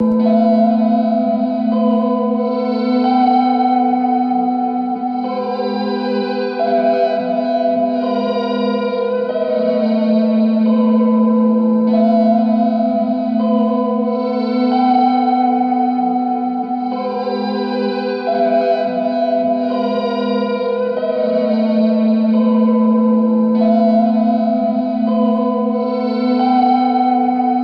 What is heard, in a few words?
notes ringing in a pool of sound